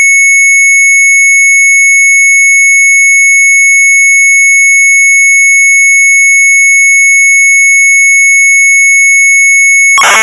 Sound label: MZ800 Tape; sharp; BEREKEN BTW; retromelsarchive